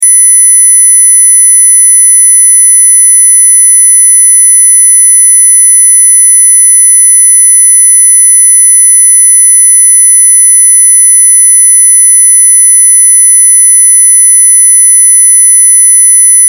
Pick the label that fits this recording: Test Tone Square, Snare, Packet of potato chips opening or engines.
Test Tone Square